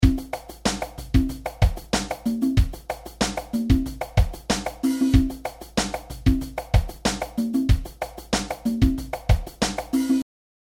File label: 16th Funk